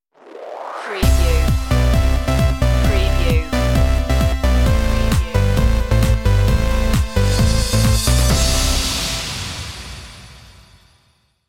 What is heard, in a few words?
dreamwave stinger